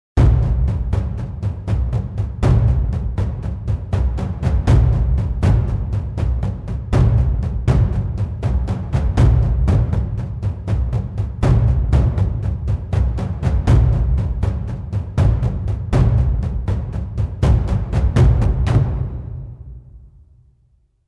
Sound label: Taiko Odd Rhythms 9 8 8th feel; Taiko Invasion